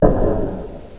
Clunk; Gunlok

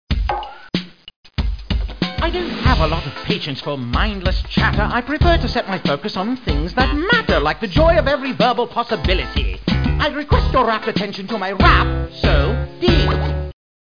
RAP

